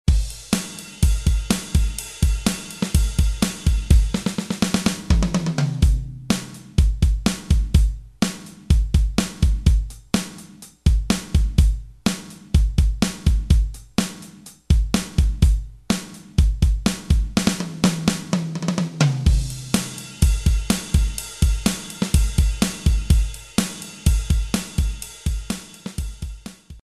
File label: rock drums